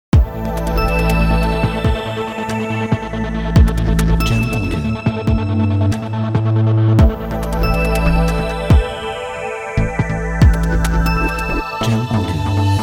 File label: Tech Intro